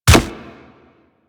Step Default, Footsteps; Warframe; Infested Charger

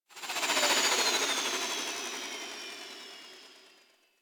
Archwing movement wind down; Warframe

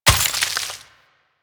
Vial Ice Shatter; elements; Warframe; Warframes; Alchemist

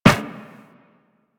Run Metal Perforated, Footsteps; Warframe; Operator

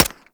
Arch Long Rifle Mech, Weapons; Warframe; Archwing

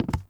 Walk Wood, Footsteps; Enemy; Mockeries; Mockery

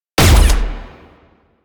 Beam Punch, Weapons; Warframe; Archwing; Larkspur Prime

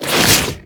Inf Transform Claws Whoosh, Infested

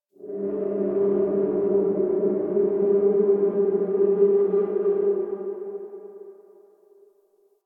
Eerie Drone

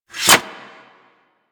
Sword Board Unequip; Warframe; Tenno

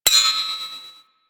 Rifle Reload Eject Ping; Weapons; Warframe; Thanotech

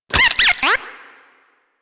Lure Move; Warframe; Teralyst; Vomvalyst Lure

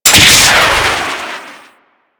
Jet Pack Dodge Burst; Jet Pack Marine; Warframe; Grineer

